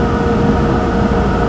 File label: Fan Loop; Marble Blast Gold